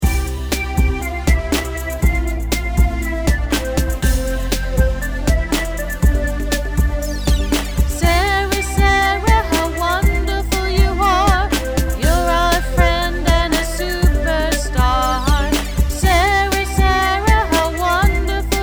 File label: vocals